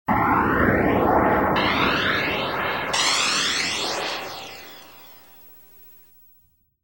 synthesizer; SOUND EFFECTS1

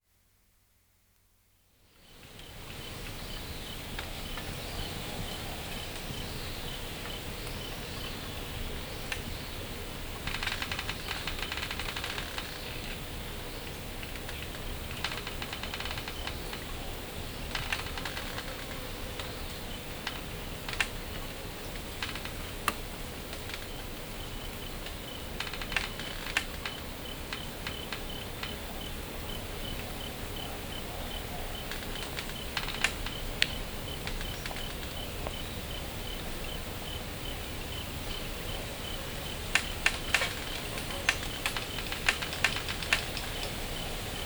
In a Forest of Bamboo; Dr. Sound Effects; 210 Nature Sounds - 20 Hours of Relaxing Natural Ambiences for Meditation and Sleep

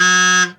HORN, CARTOON; 1000fx

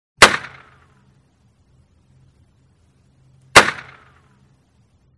Gun Shots; SOUND EFFECTS1

